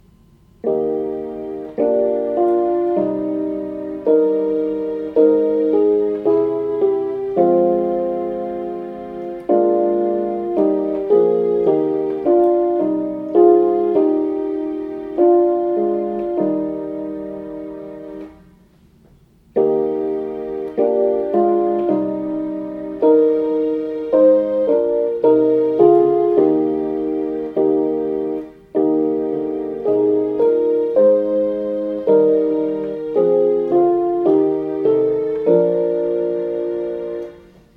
Bleib bei uns piano; Katholische Gemeinde Salvator in Lichtenrade; Chor

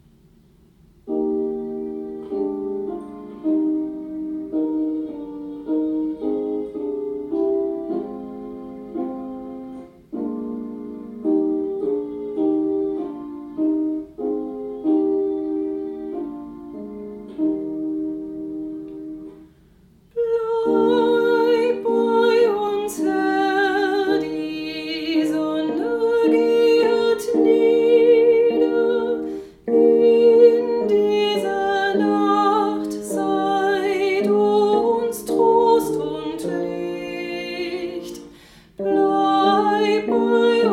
Bleib bei uns Tenor; Katholische Gemeinde Salvator in Lichtenrade; Chor

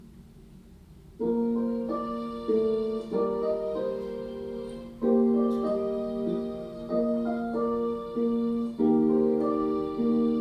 Kyrie Tenor, Chor

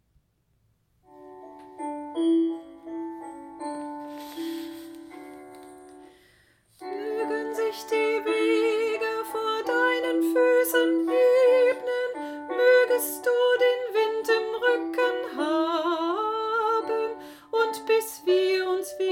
Tenor; Moegen sich die Wege

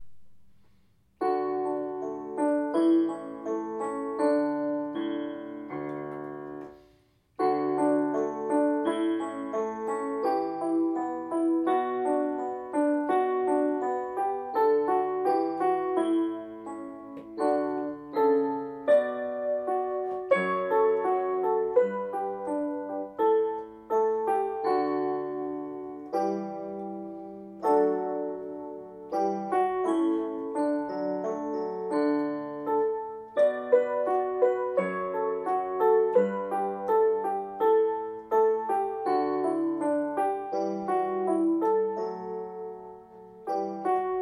E-Piano; Moegen sich die Wege

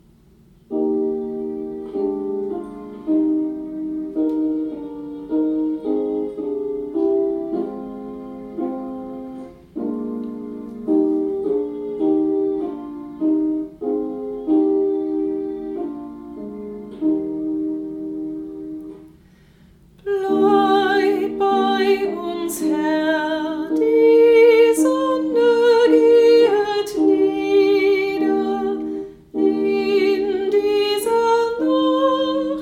Bleib bei uns Sopran; Katholische Gemeinde Salvator in Lichtenrade; Chor

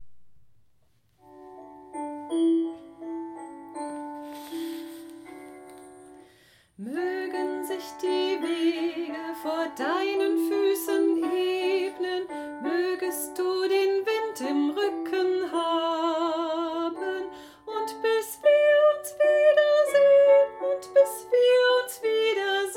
Sopran; Moegen sich die Wege

